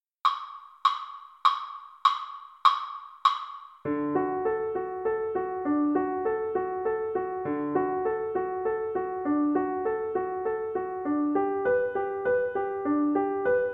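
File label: violas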